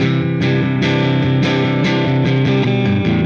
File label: Silos Dirty Strum Guitar, Indie Rock